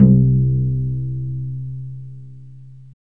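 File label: strings violoncello pizzicato, Chamber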